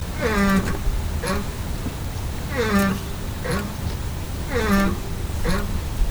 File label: clutch sounds